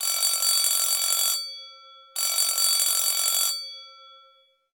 alarm, alarms; Freetel RAIJIN-FTJ162E

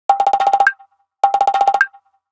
Monkey drum, phonetones Nokia N8

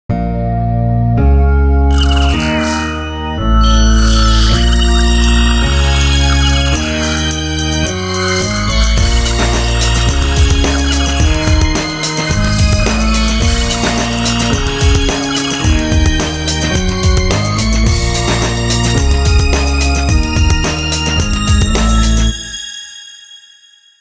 Funk; Alcatel; PC-Suite-4.17

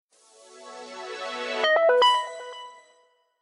Power On; LG; Optimus L5 E610v

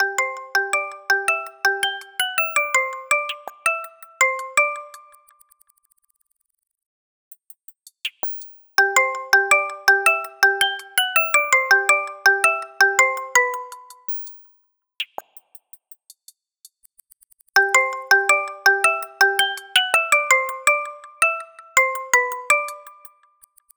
Alarm Ease Up; alarms

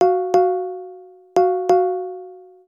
alarm battery; BlackBerry OS-10

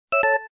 connect, phone tones; LG GD570 dLite

